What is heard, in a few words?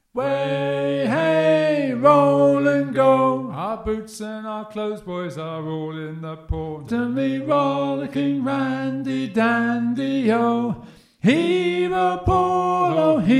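Randy Dandy Oh - alto, sea shanty teaching track; eccleshall singathon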